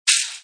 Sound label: skate; hello kitty